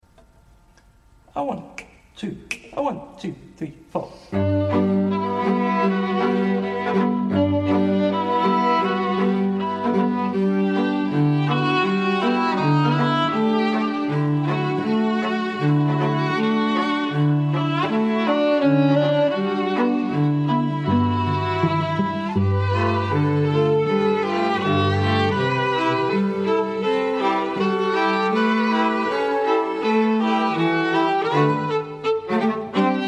strings

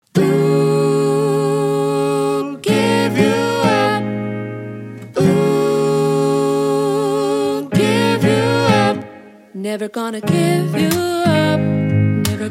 acoustic

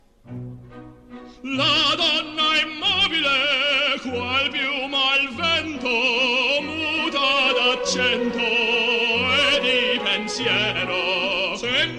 singers; Opera

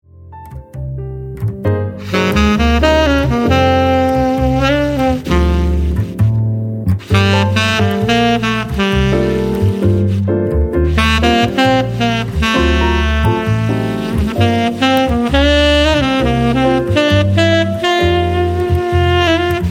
jazz; jazz swing bands

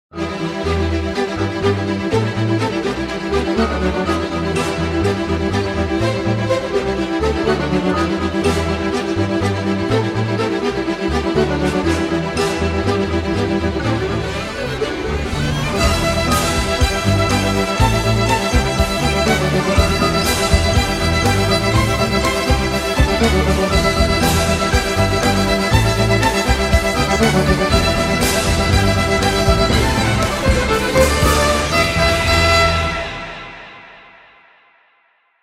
violinist; classical musicians

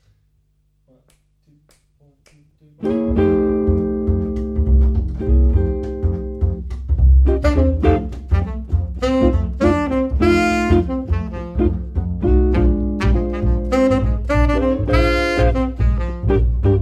trio

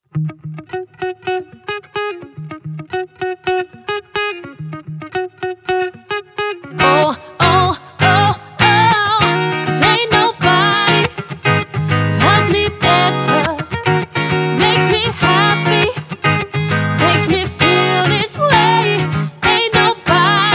acoustic

